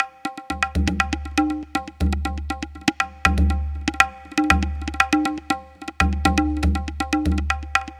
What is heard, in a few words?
Tablatron, Processed Percussion